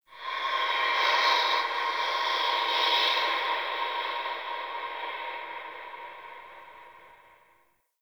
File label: Haunted Breath, Ambience; Warframe; Shadowgrapher